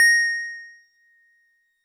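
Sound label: Wood Piano DX100 A#1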